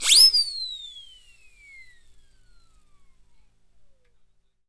WHSLSIRE, Synclavier Percussion World & Orchestral; ILIO; Synclavier Percussion 2